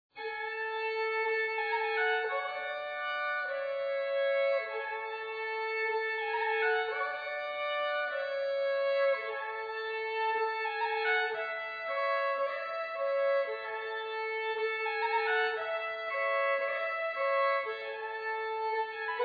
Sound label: Bruremarsj Fiddle; Etter Myllarguten; grieg